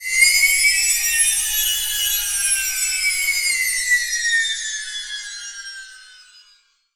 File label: Flyer; Zero-G Seismic Frequencies; Flyers